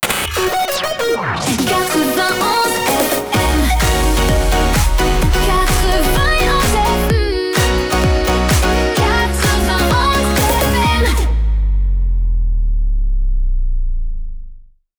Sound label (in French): Chanté Theme1 F# 128 BPM Shotgun (No VO); Habillages